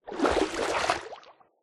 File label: swim, liquid; Minecraft